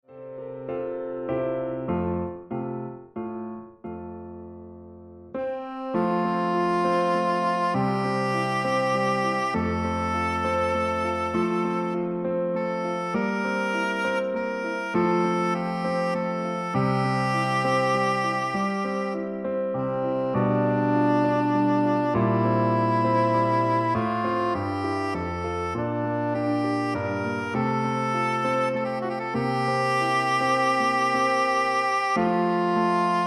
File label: Piano Sopran Sax; 2019; Plaisir d'amour